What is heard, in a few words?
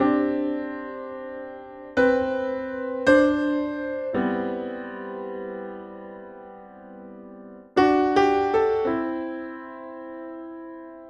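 Country Piano, Breakdown; Digidesign; Pro Tools Essential Loops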